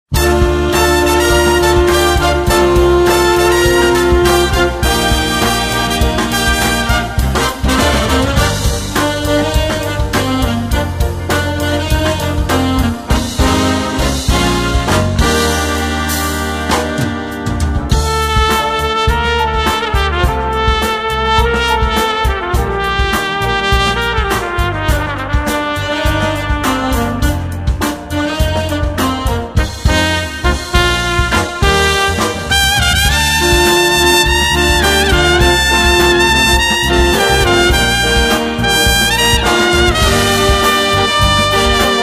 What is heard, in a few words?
Trumpet